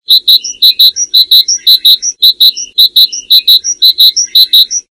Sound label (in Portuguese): chapim-azul sons de animais; passaro